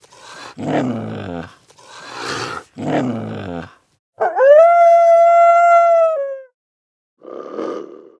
Shadow Fox Idle

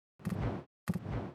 Fenrir Run; Seer; GamigoNA

